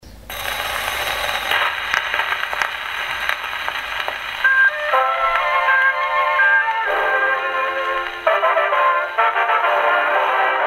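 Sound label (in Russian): музыка из старого граммофона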